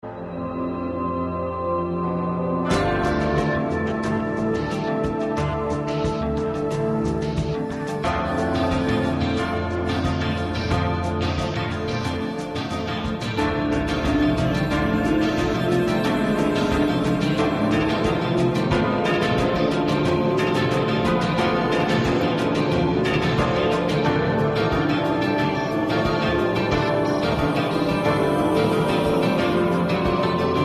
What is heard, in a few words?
Loop Tense